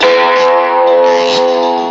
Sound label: Ancient Music FX, Industrial